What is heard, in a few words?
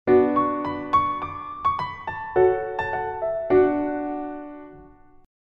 boot sound; Condor; SPRG02-Telenor-Infinity-E4